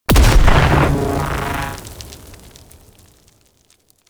Venus Unicycle Explosion

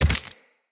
Spider Step Dirt; Foley; Root Spider Mother

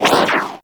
ring bounce; Universe Ring; Warframe; Nezha

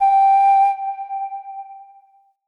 Flute Alt G; Player Instrument

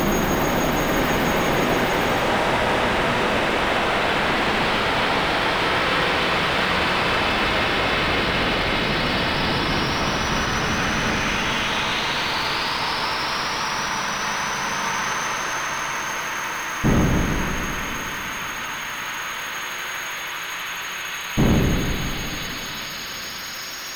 Bed Loop, Interior; Warframe; Shadowgrapher

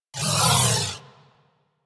Crewship Turret Proj By, Corpus

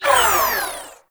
Uzi Tail Wind Down; Warframe; Tenno; Prime Tenno Uzi; Weapons

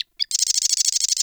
Forest Bird Squak; Warframe; Grineer Forest

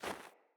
Sandals Run Snow, Footsteps

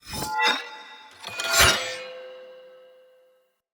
Cleaver Finisher Front Accent, Swords; Weapons; Player; Bromius Cleaver

